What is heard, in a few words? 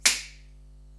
IR; Corso acustica